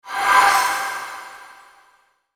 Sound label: Sweeper Shimmer Pan Chimes; Production Element Imaging Element Accent Transition; Sweeper Sound Effects